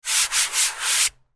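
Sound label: eraser sound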